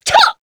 succubus shout; queen girl

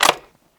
bolt down, l96; weapons

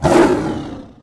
snork attack hit

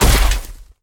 step run

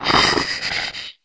aware; spider

